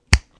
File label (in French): Doigt; Mobiclic51